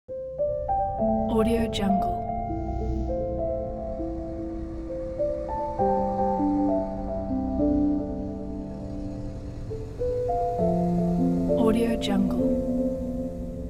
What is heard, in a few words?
sadness; kipaloops